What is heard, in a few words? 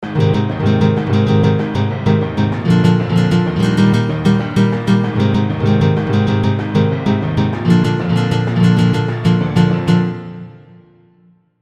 adventurous americana, loops